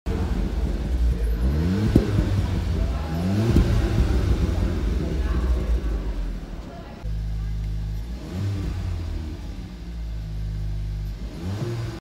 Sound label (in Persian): Tucson, ماشین